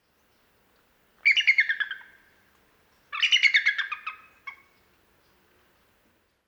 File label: Bald Eagle Haliaeetus leucocephalus